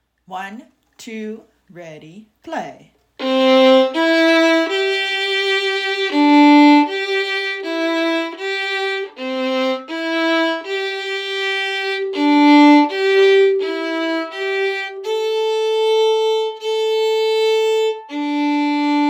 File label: Harmony